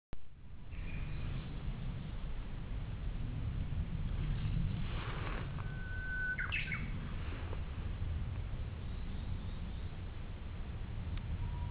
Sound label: birds